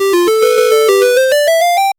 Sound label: heal sound in pixel game